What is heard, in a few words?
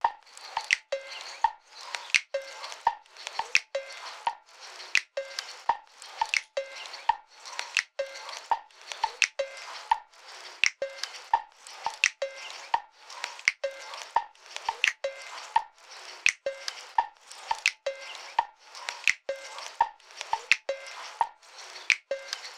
85 perc loop